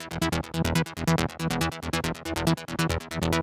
Bassline Middle 140BPM, E minor; Vengeance Samples

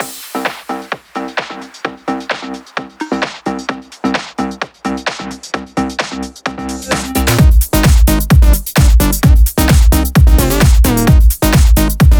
130BPM Mix; VFH1 130BPM Danzon Kit

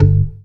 Synth Shots; Vengeance Samples

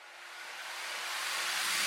FX Uplifter

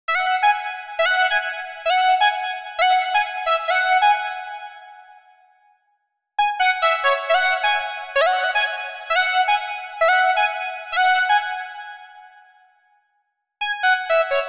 R&B Synth 265 bpm

